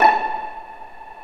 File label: Vla Piz A4; Digital Sound Factory Virtuoso